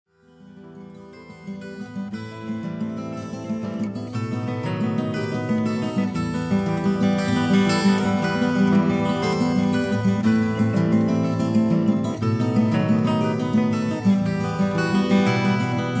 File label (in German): Susis Spieluhr